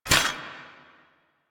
Footstep Default; Warframe; Hoplite

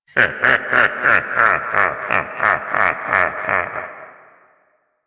Laughter

